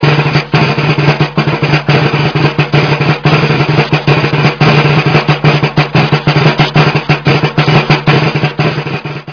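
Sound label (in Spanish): redoblante; tradiberia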